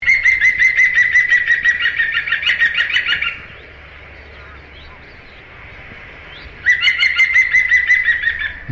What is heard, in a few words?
Green Woodpecker